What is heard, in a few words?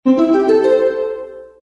harp ascending high plucks